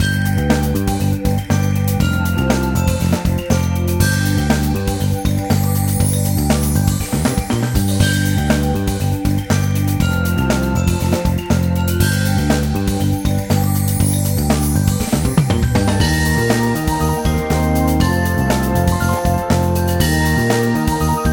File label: Action; Polar Adventures We Save the Antarctica